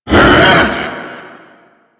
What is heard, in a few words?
Ape Prelate Attack Short, Vocals; Proto Infested